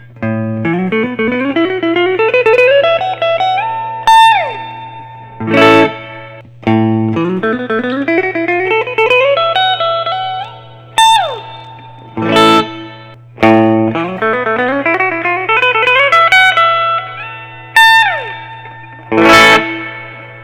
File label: grosh custom carve top t natural burst